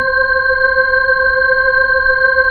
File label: B-3 Chorus